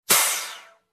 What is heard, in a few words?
Metal Trash Can Lid drop